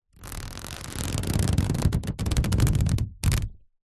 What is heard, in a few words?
household plastic food wrap cling film stretched rubs squeaks